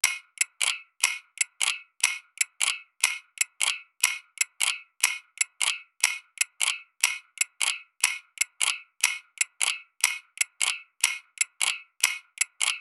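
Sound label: rhythmic ticking of an old, timekeeping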